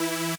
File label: lead o5e